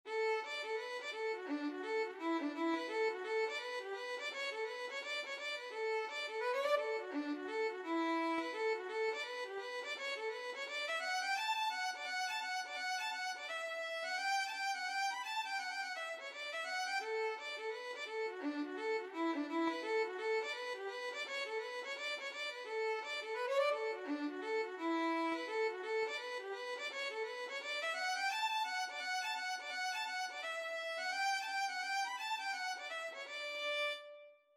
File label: violin; bonnie kate